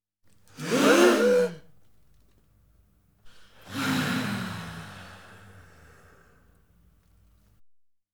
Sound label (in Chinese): 吸气、呼气、放松、众人(成人)